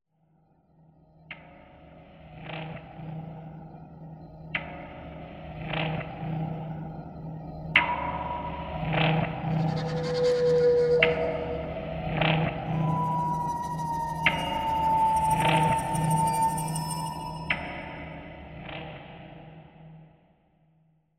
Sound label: bridge suspense mood, music; inFamous; PS3